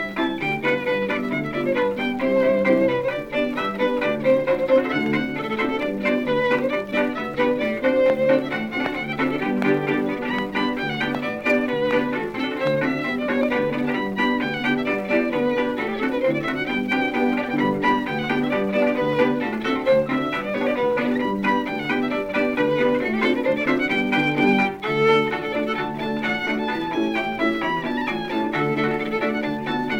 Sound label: The Happy Birdie-The Blue Bell (polkas)